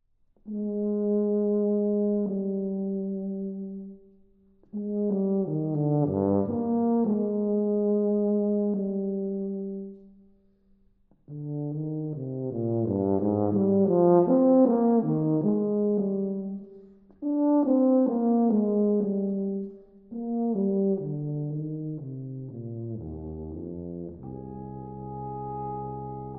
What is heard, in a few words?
Dialogue For Horn, Tuba and Piano